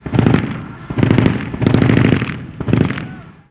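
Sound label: gun us assault medium; ModernWarfare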